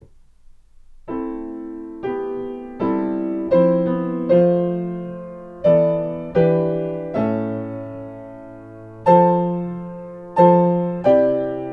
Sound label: mass for hilary piano; choral